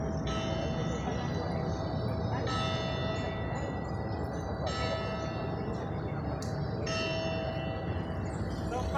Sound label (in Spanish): Campanas Iglesia; Sibga